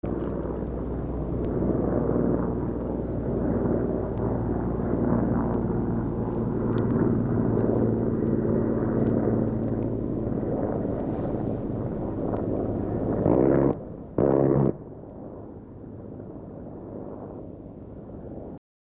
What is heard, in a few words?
mi35 machinegun